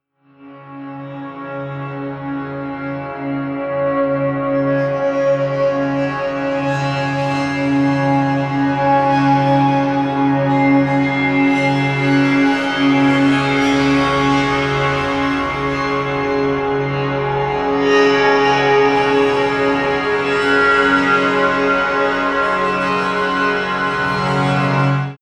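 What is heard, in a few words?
majestic brightness, pads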